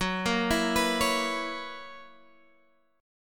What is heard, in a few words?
F sharp 11th F sharp Guitar Standard; arpeggiated; JGuitar